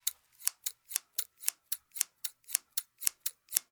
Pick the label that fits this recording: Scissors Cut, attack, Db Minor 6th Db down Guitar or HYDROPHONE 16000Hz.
Scissors Cut